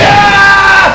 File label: pain; JOSEPHIN